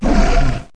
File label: attack devastator